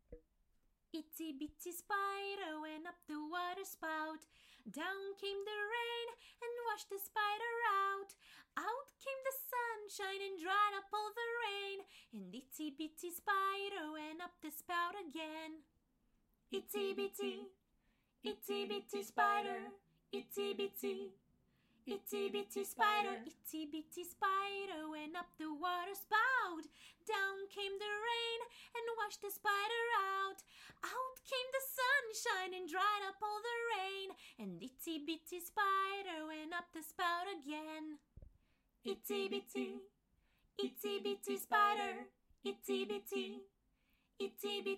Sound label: NURSERY RHYME SONG A CAPPELLA